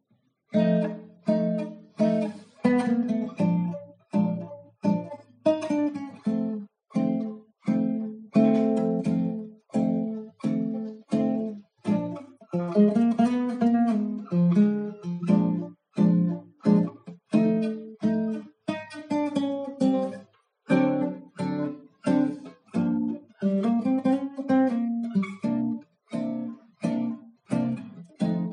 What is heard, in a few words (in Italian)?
Blues in Fa